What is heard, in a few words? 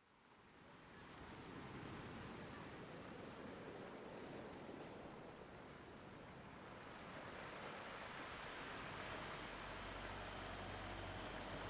Ocean Whale